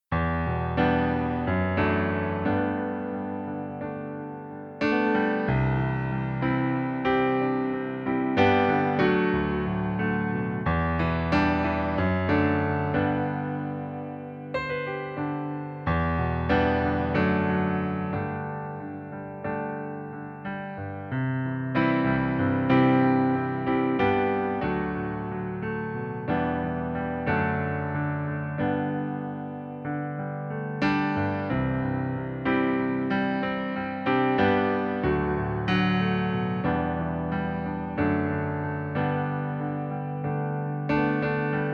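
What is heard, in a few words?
piano playback G major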